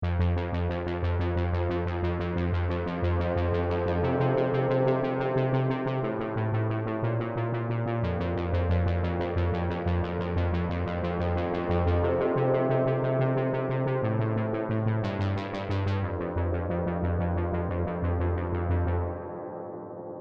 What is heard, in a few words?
Arp 3 String Decay Full; U-He Twangstroem; Tyrell N6